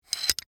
Metal Slide Latch, Foley, Metal, Slide, Latch, Open; Latches; Objects; Blast Wave